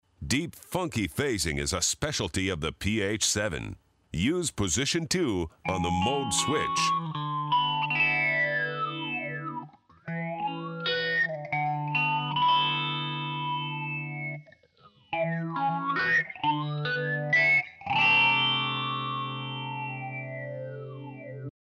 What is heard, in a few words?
Deep Phase